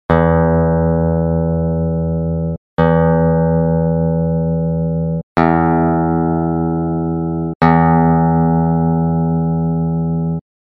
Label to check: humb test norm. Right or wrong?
Right